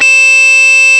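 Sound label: Guitar Harmonics Ab3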